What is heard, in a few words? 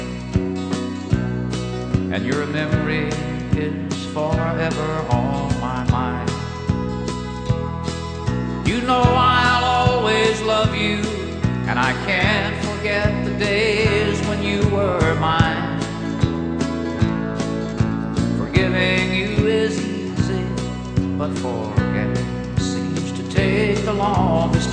country